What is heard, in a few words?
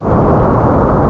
roar